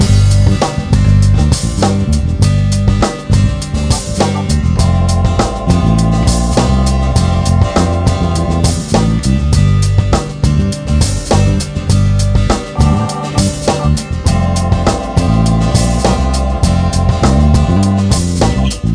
Jazz Loop; Puppy

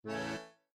Festival Balloon, windows 7

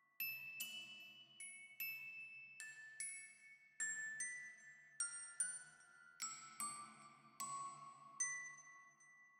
Music Box Layer

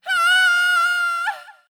Valkyrie Death

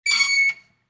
truck stuck; Through Abandoned: The Underground City

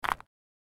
Stone Destroying; My Cute Commissar

